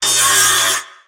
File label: tango cut; dota; shredder